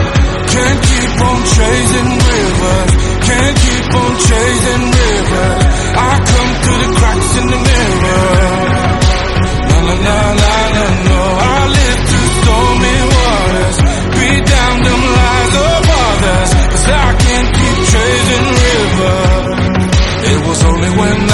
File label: english song tone ringtone